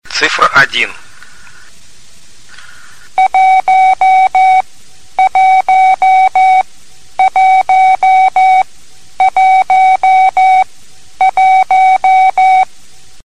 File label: CW